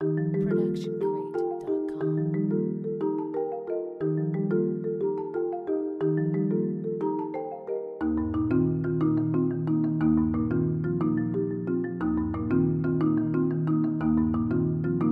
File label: happy marimba; soundscrate